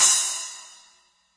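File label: high crash; classic hip hop; STYLE KITS; Classic Hip Hop Mega Kit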